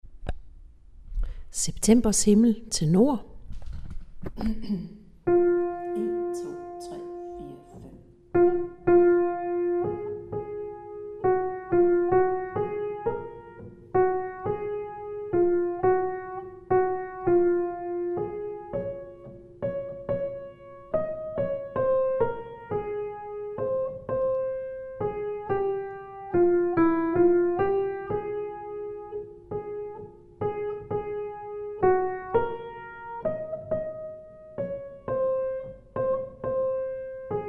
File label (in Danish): Tenor